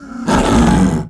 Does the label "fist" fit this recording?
No